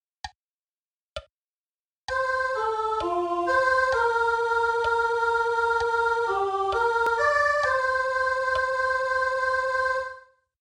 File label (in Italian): Salmo BAS